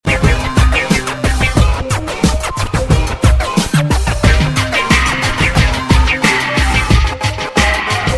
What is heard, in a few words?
Turntables